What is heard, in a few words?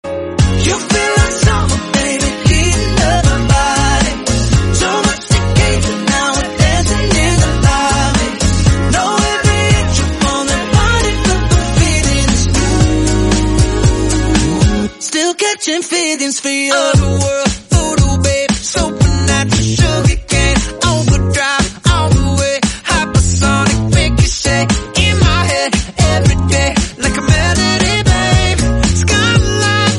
hey you feel like summer baby, english song